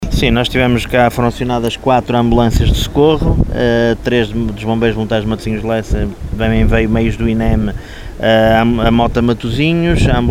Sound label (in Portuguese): PRAIA CORGO FALSO ALARME